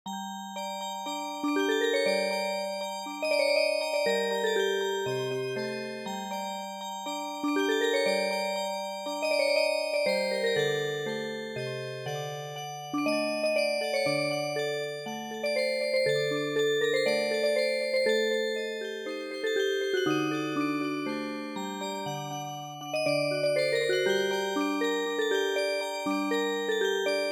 Music Box

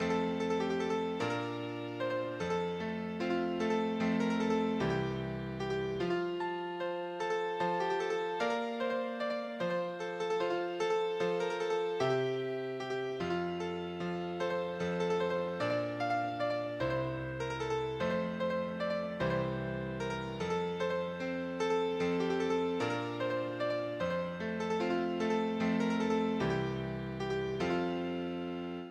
Spanish Healing Piano; midi; traditional

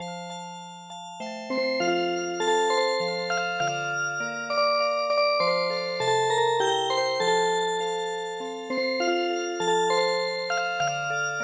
waits early spring Music Box

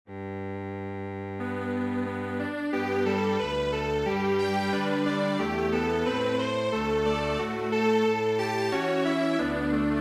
new leaf song Strings Quartet

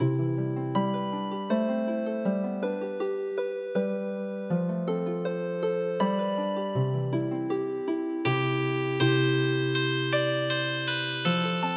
Healing Harp, midi traditional; We Wish You A Merry Christmas

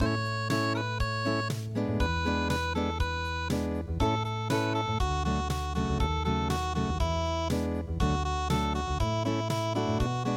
spring unpluged

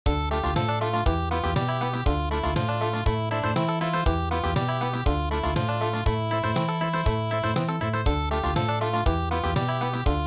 dance Country

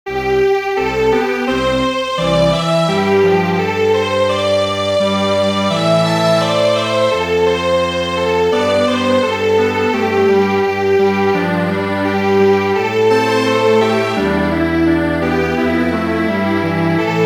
Strings Quartet

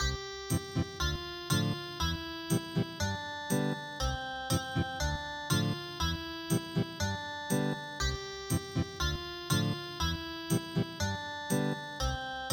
Ethnic Guitar

